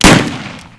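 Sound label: nagant revolver weapon fire; weapons; Spearhead Demo; MacFormat 2003 June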